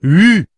huuu

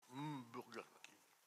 hmm; burger king

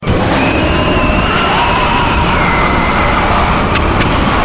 hollow horror

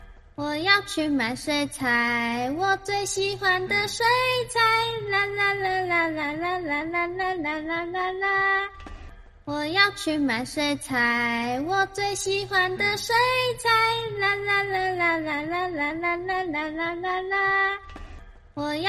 ringtone

